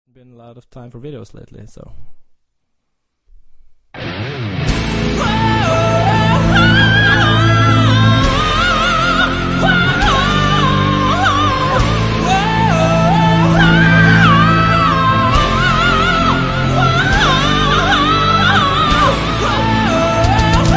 woah oh oh ah ah ah aaaa ha ah ah ah

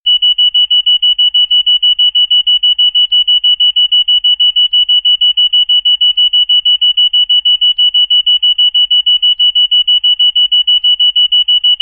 House Fire Alert

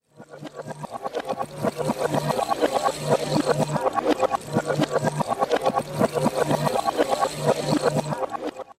Bamboo

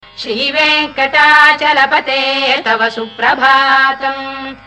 balaji mantra

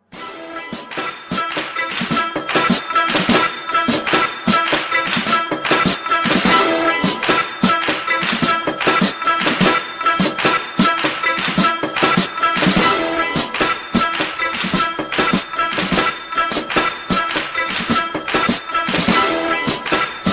instrumental

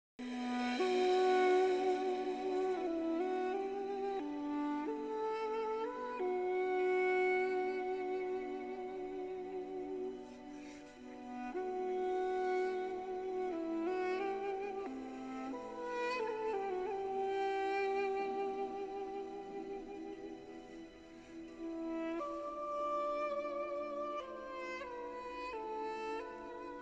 Flute

